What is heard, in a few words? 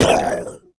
fall; evil eye